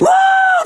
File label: "woo", male